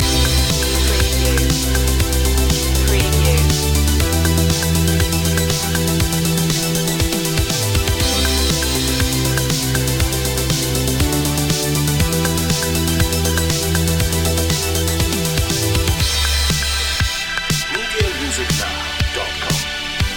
80s gamer loop

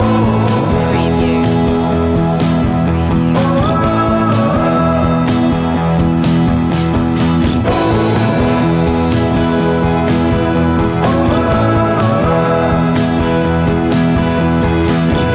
rock with us loop

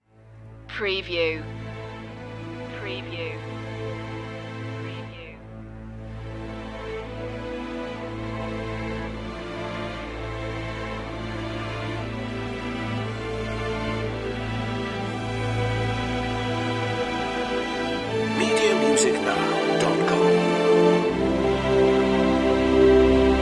strings brass choir

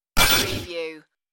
space door lock

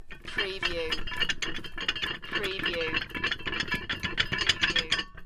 Heavy Chain Rattling On Winch Short

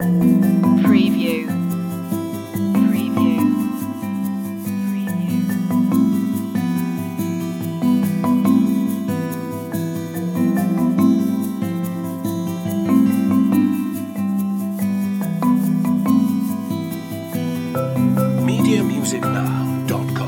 gemini 2 loop

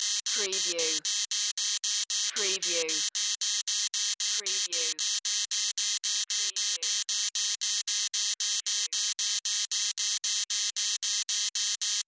Alarm Computer Loop; sound effects bible

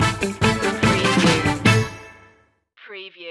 give me one time stinger

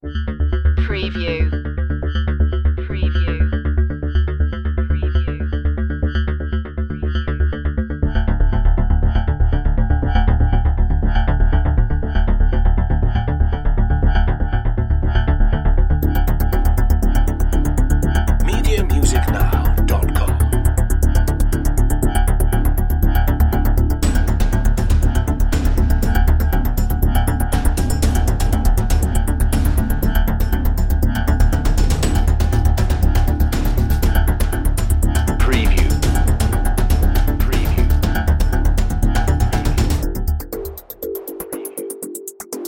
dnb

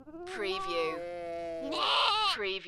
Animal Sheep Lamb and Adult

